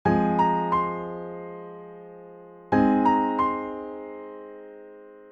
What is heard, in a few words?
A flat melodic